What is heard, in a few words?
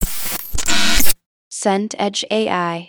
glitch burst